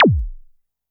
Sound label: korg trident